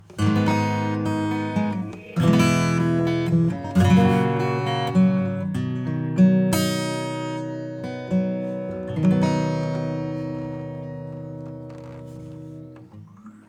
Delay acoustic; Cluster; preset SOCKETS

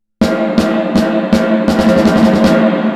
Reverb Snare; RO-140

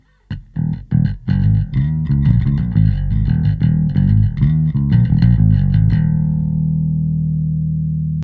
bass; AMEK; preset TG Acstc Bass Push

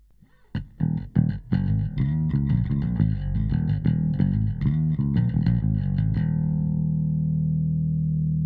Bass harmonics out

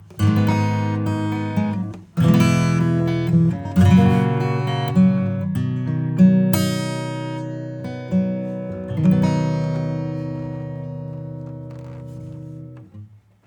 Guitar Sharp; Nanopulse

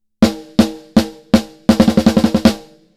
Snare Hit Harder; Oxygen

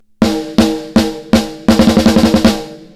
snare; smart comp; fetpressor

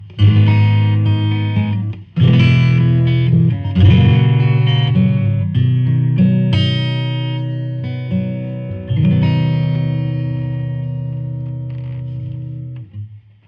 CABINET Stuffed BASS 20.0 dB; Valves

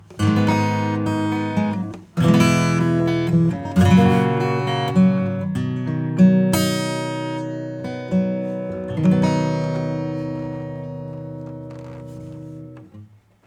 acoustic; Expanse 3D

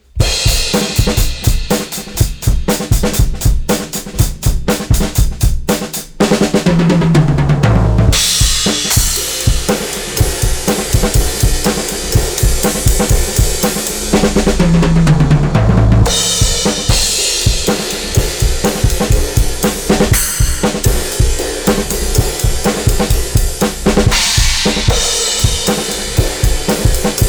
drums; SKYE Clipper

